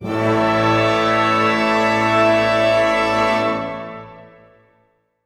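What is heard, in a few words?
orchestra; Masterbits - Switch FX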